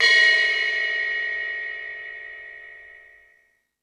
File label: Fusion Stix, E-MU Pro-Cussion; drum machine